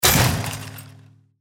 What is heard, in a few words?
obstacle destroyed metal